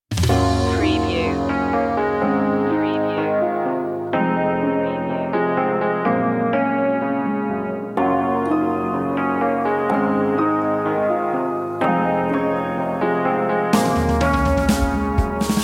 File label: thinking no guitar loop intro; media music now